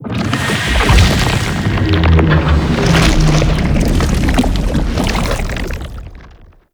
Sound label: Drifter Pull Start; gameplay; Warframe; NineteenNinetyNine; Stage12PartyOfALifetime